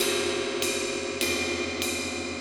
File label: Wind Gong Cymbal; Metal; Sonic Foundry (Sony Creative Software) - Ambient Atmospheres & Rhythms; Ambient-Metals